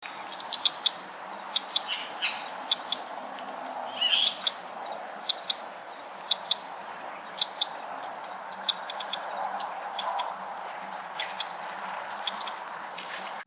Martín Pescador Chico, Chloroceryle americana, Green Kingfisher; cantos